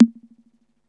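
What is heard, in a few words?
BONGO DOWN; Music Store Sound Service; Einstein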